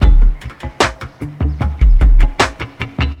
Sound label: Loop